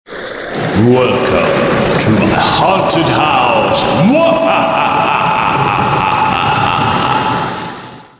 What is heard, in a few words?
alert Haunted House; Halloween; Arphiola ringtones